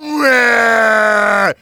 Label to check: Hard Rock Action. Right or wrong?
Wrong